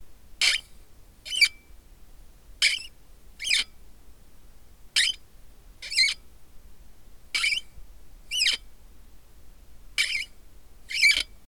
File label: Metal Flask Cap Screw Unscrew